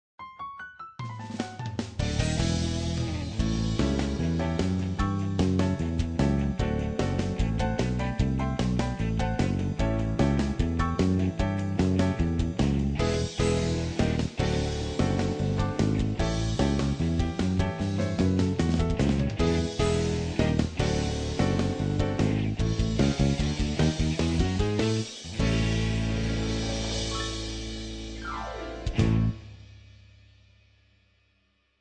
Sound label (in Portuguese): base para vinheta cantada